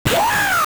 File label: yell; zero kamikaze squirrel